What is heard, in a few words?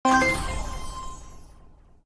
lab secret end